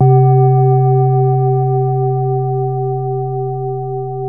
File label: BOWL, Tibetan Bowls